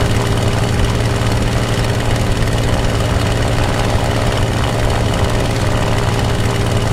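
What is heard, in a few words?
me109 cockpit right, engines; War Thunder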